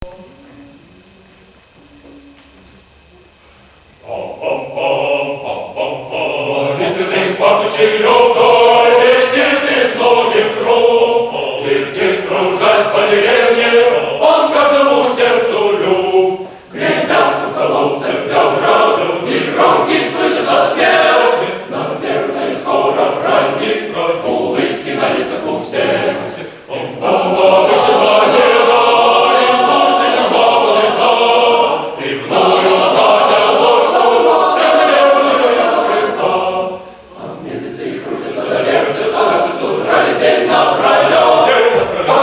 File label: male choir, live; stariki pivovary; male choir of mephi